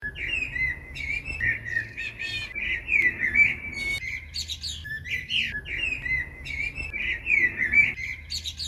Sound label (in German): Vogel Ton; wald